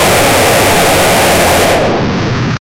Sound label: future rocket launcher sh